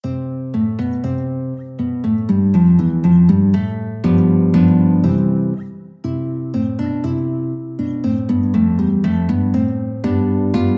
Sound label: Contradanzas, guitar